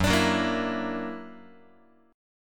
Gb Augmented 7th, down, Guitar; strummed; 4A,4E,5A,5D,5G#,6C#

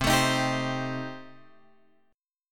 C Minor 6th Guitar Open F, chord; Cm6 strummed; JGuitar

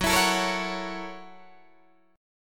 Gb 7th Sharp 9th Gb Guitar Open D

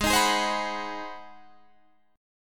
Ab 7th Sharp 9th Guitar Open D, chord

